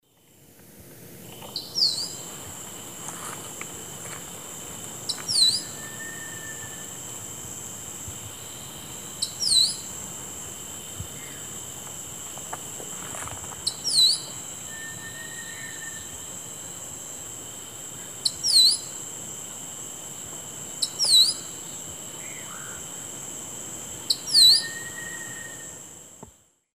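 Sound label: Caprimulgus maculicaudus, song playback; S. America; Eastern Lowlands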